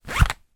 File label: makeup pouch fabric zipper close fast with movement of items inside; foley